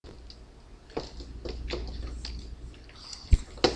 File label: rain